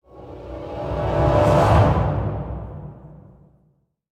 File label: Pistol Sequence Musical Stinger